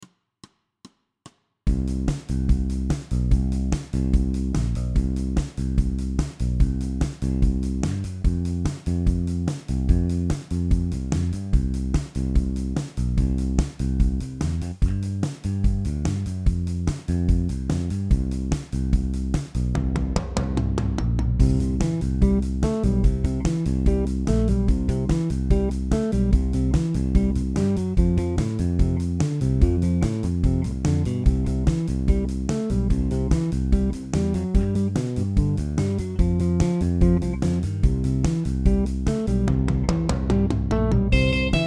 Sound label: Level BGM; ReplayPuzzleGame